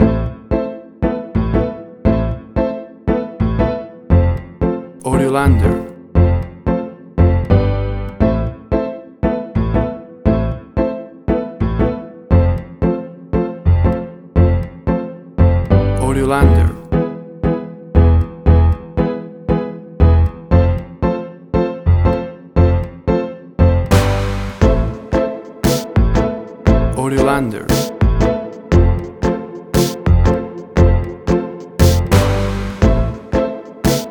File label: Be Bold or Italic 117bpm